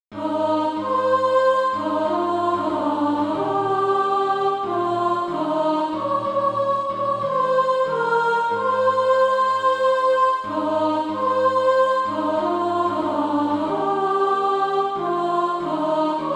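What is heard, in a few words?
soprano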